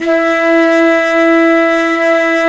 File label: mute flute, hybrid winds